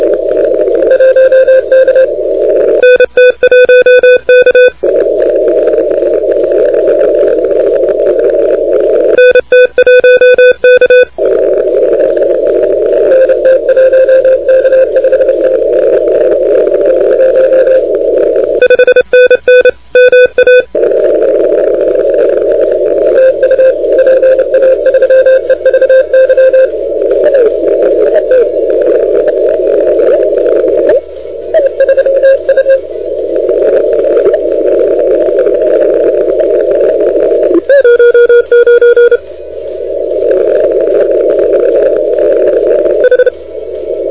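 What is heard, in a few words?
continuous wave 28MHz; ARRL 10M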